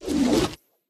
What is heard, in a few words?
hero hide; tangguo